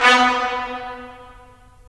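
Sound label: brass trumpets staccato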